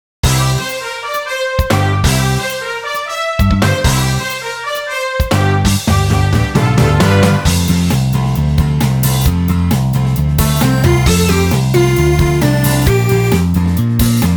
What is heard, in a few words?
adventure, bgm